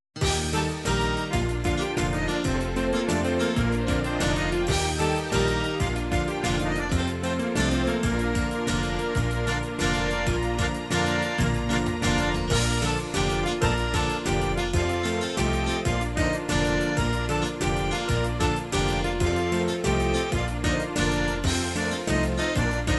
folk bulgaria horo racenic, midi

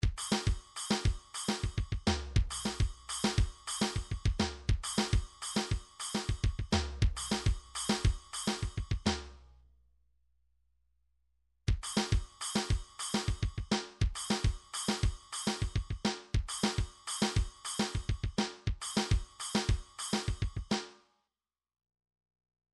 midi drums

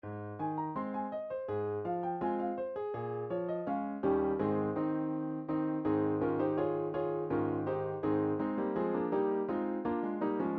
german sextet, midi

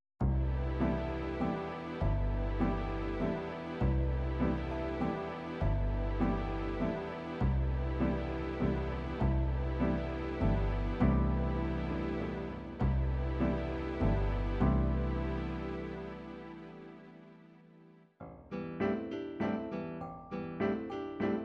waltz trad

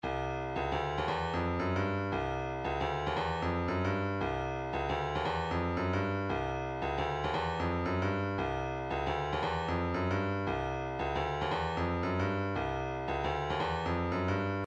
vocal planet deep breath, midi

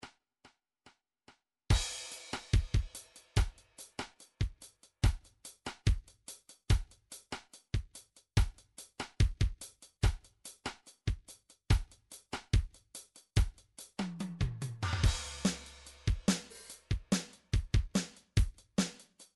softrock

